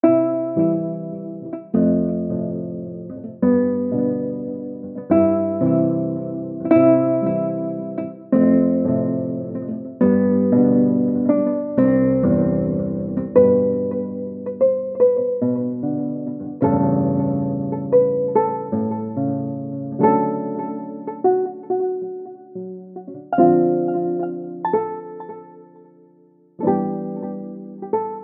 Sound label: Calm Learning